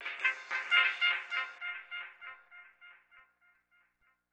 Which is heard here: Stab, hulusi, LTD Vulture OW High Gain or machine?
Stab